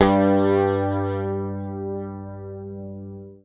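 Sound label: bass, G2, right channel; PICK; Sampleheads; Will Lee Bass Library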